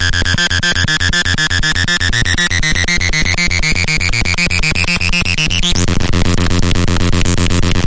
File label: 120 aggressive mono synth; SYNTH AND ELECTRONIC LOOPS